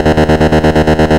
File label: Sawtooth-Trem D#1; Ableton Foundation FreePacks; Foundation Glitch Toys & LoFi